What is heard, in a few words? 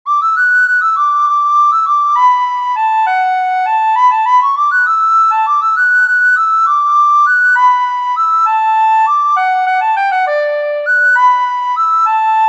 whistle; compoz